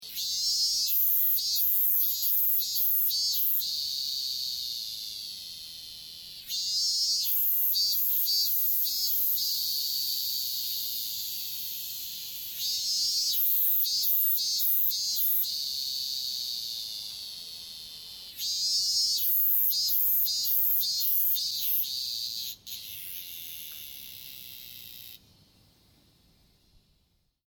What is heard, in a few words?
cicada